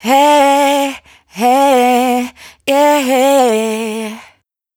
riff Hey Yay Bm, Dry Vocals; Raw Vocals; Slate Digital Omnivox Vocal Sample Pack

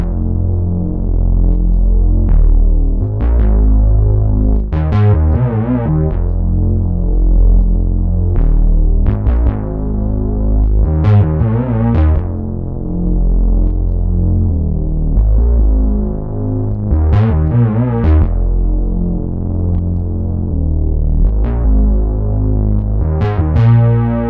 Synth loop 79 Fm; Synth Bass Loops; Slate Digital BASSHOLE Sample Pack

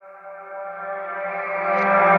vocal hypnotic G; SD AURORA Sample Pack; Slate

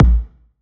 kick oneshot, Pop; Drums; SD PASADENA Pop Sample Pack; Slate

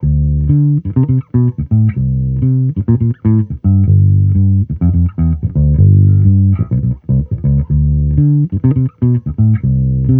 Amped loop 125 G, Electric Bass Loops; Slate Digital BASSHOLE Sample Pack

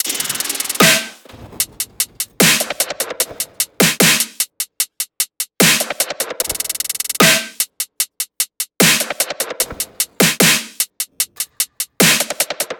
tops 150, Drum Sample Pack, Loops, Top Loops; SD SEISMIC; Slate

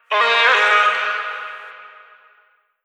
Vocal one shot Ab; Slate Digital Omnivox Vocal Sample Pack

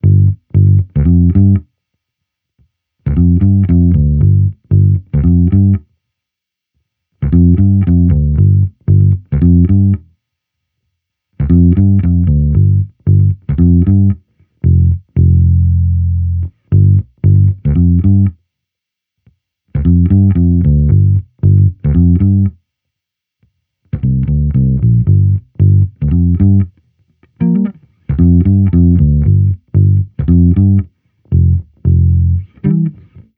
Amped loop 115 Gm, Electric Bass Loops; Slate Digital BASSHOLE Sample Pack

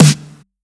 Snare Hybrid, 909 Snares; Slate; Vintage Drum Machines Volume 3 909 Edition

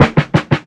snare roll; Slate; SD AURORA Sample Pack; Snares

